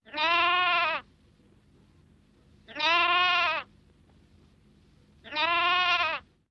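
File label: lamb sounds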